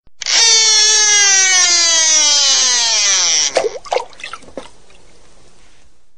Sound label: Fishing